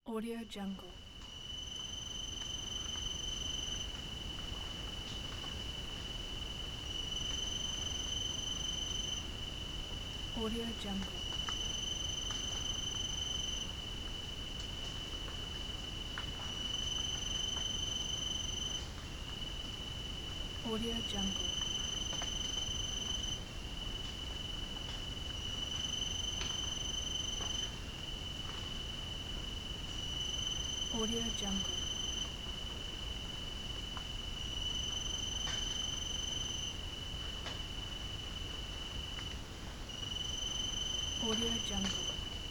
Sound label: a forest night